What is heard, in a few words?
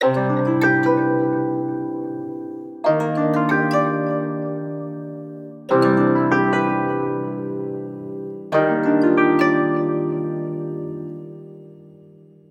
ubuntu touch koto chords